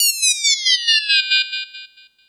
Multi FX, Drum Machines, E-MU Pro-Cussion

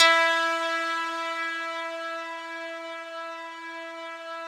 RESO METAL E4, Yamaha VP-1; Music Store

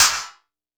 kit, Yamaha Motif-ES8

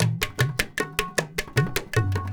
INDIA L, Heart of Asia, IND ENS

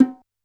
Bongo L, Standard Kit, Yamaha PSR-E323

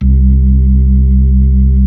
B-3, Keyboards of The 60's and 70's; Club Xfade

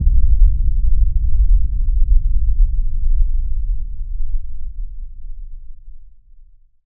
amb, Ambi Drones, Chillout; Best Service ProSamples vol.36 - Chillout

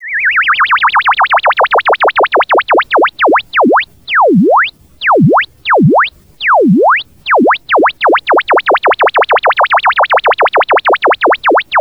analog, special fx twisted; USB Soundscan; AKAI

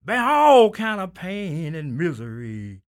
SING, Blues Soul; Spectrasonics Vocal Planet

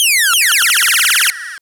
Zapps, sci-fi

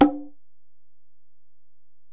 Ac Perc, Drum Machines Alesis DM-PRO

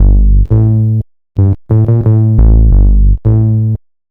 Bass, Futurist Drum & Bass

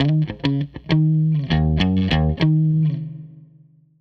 Strat Guitar Loop

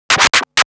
Scratch Fills 140BPM

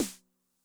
SNARE DRUM Med Tune Low Snappy, 909; classic machines; 909mod

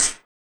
RSS PERC

